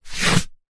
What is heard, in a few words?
household book large hardback pick up from on top of another; zapsplat